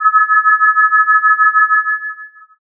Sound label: forcefield